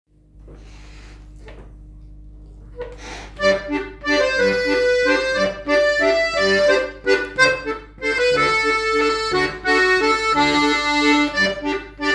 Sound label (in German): Walzer